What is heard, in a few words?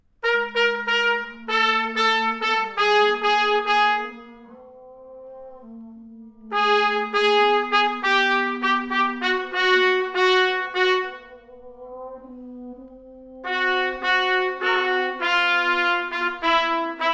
Fanfare